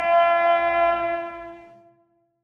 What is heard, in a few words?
car horn; Film Noir; SoundPad